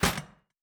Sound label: Armour UI; Fantasy Interface; Fantasy Sounds Bundle